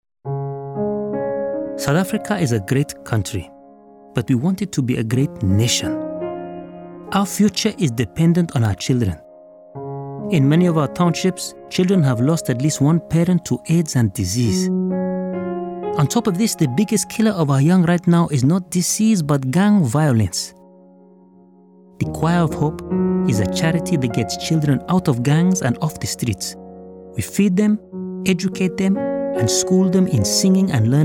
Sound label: Choir Of Hope